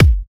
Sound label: original bass drum sample g sharp key